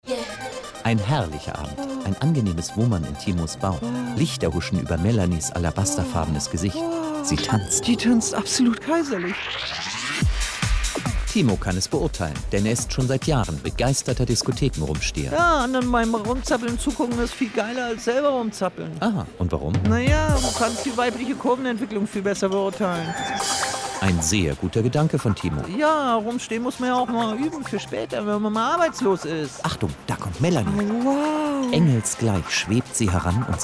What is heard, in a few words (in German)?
Disko